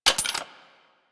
Rifle Alt Mech A; Sundial

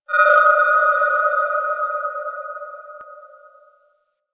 Moon Chimes Medium, Ambience; Warframe; Orokin

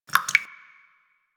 Water Drips; Warframe; Quests; The New War; Ambience; Zariman

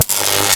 Ocean Electric Surge Small; Warframe; Grineer Ocean

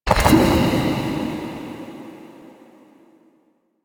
Go Board Opponent Capture; Warframe; Sacrifice quest

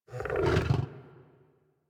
Kubrow Tame Interact Sleep Roll Prone; Warframe

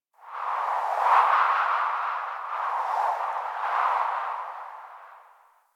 Eidolon Wind Gust, Ambience Plains; Warframe

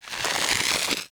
Blind Bow Draw; Powers; Fey; Saboteur

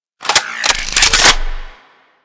Polearm Equipped; Warframe; Weapons; Corpus

